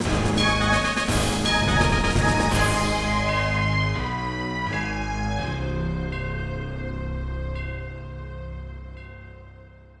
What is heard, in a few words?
Fanfare